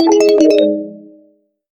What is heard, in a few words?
videogame star collect